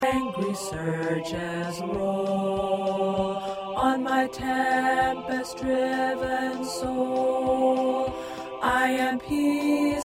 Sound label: Tenor, choir parts